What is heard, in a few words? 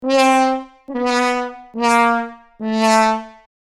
Sad trombone wah wah wah fail comedy; cartoon comedy